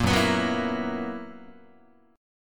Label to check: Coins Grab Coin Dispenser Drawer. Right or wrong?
Wrong